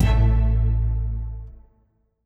100bpm light staccato cello ending underscore short